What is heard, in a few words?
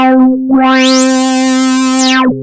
OSCAR C4, synthesizer; Hollywood Edge - Giorgio Moroder Rare Synthesizer Collection